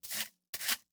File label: Household Broom Sweep Into Dustpan Multiple